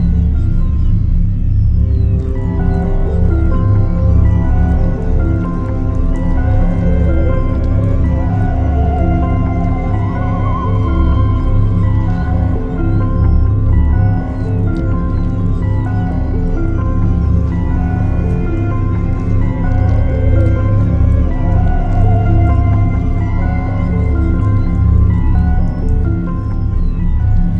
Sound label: loading music; heroes of the storm full gamerip 2015; pvebrawlstarcraft